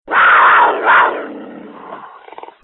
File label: Cougar Growling Sounds Car Horn